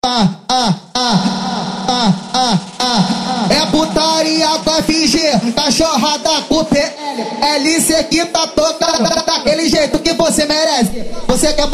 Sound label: MegaFunk